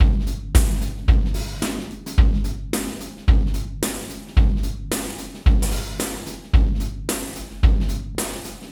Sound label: 110 rusty kit tambo